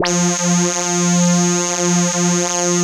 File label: CHUNKY F4, Drums; Drums In Your FACE!